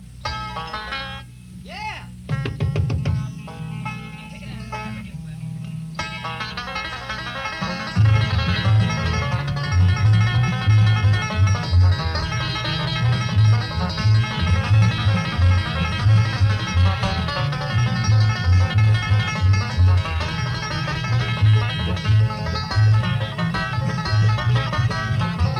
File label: Instrumental; Bluegrass